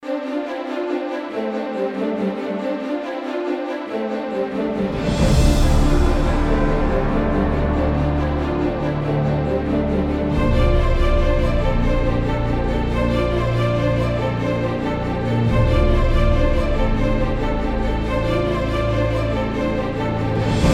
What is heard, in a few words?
Pirate; Shattered Throne